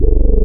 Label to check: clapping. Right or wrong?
Wrong